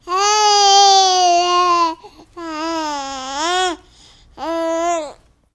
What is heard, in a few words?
Baby Voice